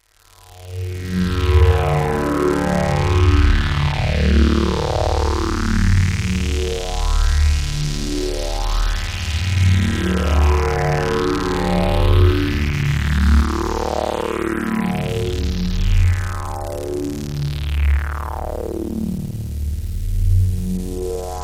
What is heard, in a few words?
multidrone